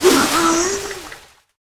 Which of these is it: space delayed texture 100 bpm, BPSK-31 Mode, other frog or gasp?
gasp